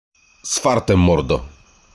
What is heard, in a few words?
fart